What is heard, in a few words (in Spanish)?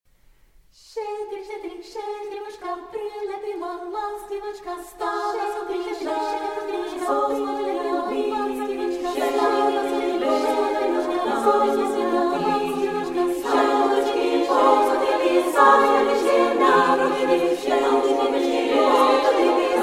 coro